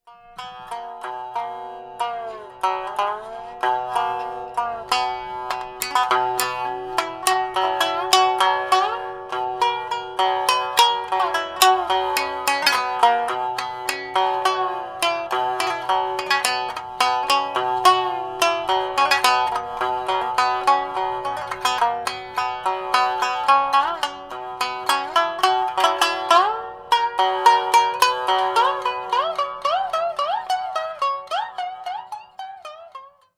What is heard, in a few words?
shamisen solo